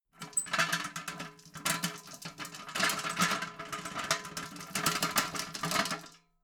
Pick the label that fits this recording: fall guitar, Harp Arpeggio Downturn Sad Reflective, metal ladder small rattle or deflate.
metal ladder small rattle